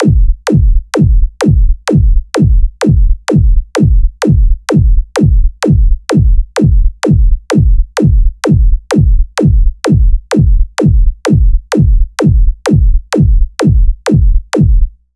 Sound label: Beat 128 bpm